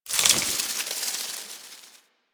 Creature Scurry; Ambience; One Offs; Warframe; Quests; The New War; Drifter Camp

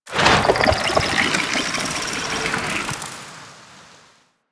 Fishing Spear Fish Spook Flying; Warframe; Infested Fishing Spear

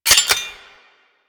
Four Barrel Rifle Mech

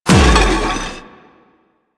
Shield Scrape; Warframe; Weapons; Melee; Whooshes

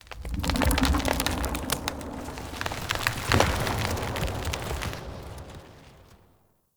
Root Cave Root Movement H; Ambience; One Offs; Neathuns Root Cave

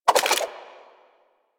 Dual Soma Clip Spin

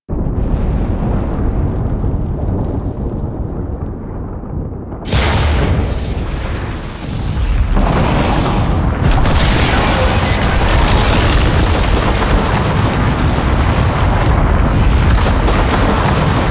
Beam Sacrifice Ambience; Warframe; The New War; Erra Beam Sacrifice

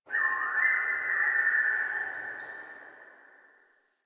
Bird; Warframe; Quests; TheNewWar; Ambience; Drifter Camp; Birds

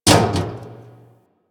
Physics Barrel Impact Medium, Metal Barrel; Physics Objects

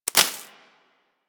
Bullet Impact Debris Grass; Warframe; Weapons

